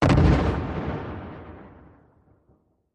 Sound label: 1x2 Pounds Pipe Bomb